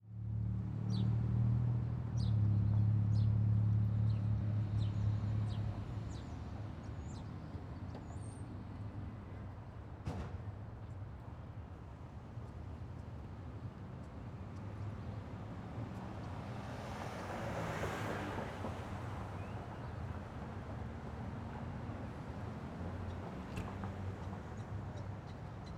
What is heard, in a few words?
Ambience Parking Lot 180D; AdobeCC